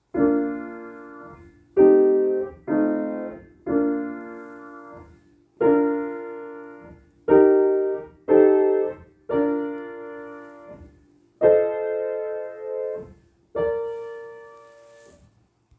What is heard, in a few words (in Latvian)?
Akordu sec. Sib maž.; Mūzikas mācību priekšmetu pedagogu veidotie metodiskie materiāli